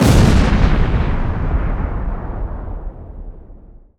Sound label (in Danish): Eksplosion